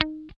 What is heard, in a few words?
GUI D#4, E-GUI MUTED; Sound & Vision; Gigapack I CD 2